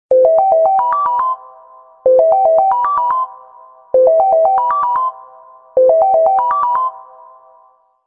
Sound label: Phone Modern